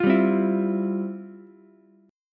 F Major 7th Suspended 2nd, Ukulele; FM7sus2 strummed; JGuitar

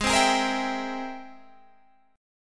G sharp Augmented Major 9th G sharp, down; G#+M9; strummed

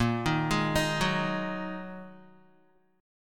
Bb 7th Suspended 4th Sharp 5th, Guitar; Bb7sus4#5; arpeggiated; 6,6,6,8,6,x; JGuitar

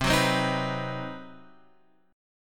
C Minor Major 7th Flat 5th, CmM7b5 chord; strummed; JGuitar

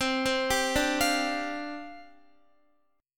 C Suspended 2nd Suspended 4th, down, Guitar

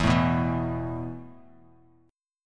E Suspended 4th E, down; Esus4 strummed

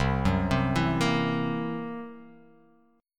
C 7th Flat 5th, C, down

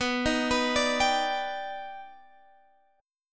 D sharp Augmented Major 7th B

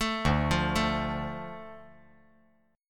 D Minor D, chord

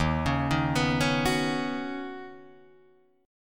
Eb Minor 7th Sharp 5th Eb Guitar Drop D

